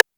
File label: Crash, Cymbals; Crashes & Cymbals